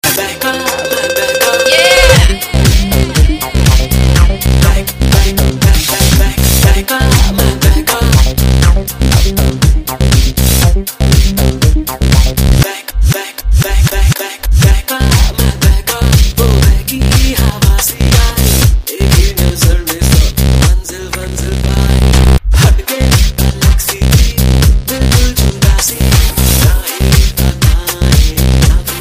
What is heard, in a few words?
instrumental